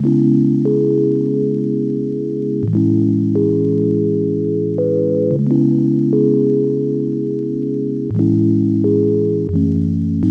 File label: E Piano - 88 BPM C# Min; Melodics; Cymatics; Eternity Sample Pack